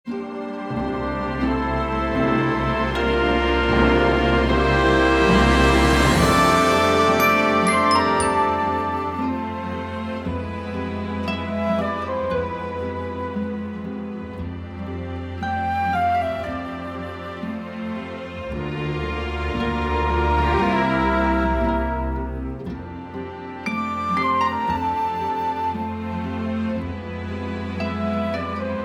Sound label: INST